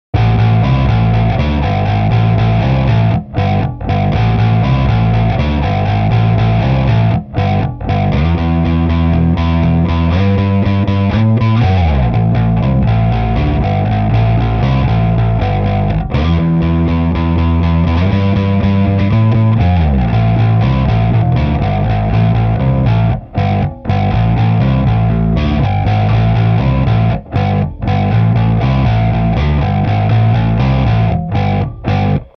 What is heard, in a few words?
bass